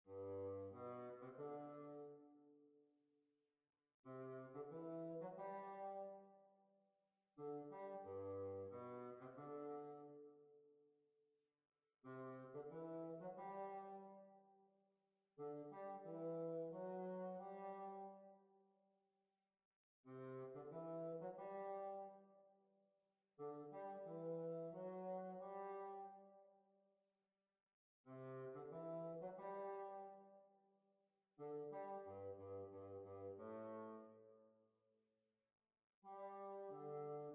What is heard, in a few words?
Hornpipe; Tumblers Hornpipe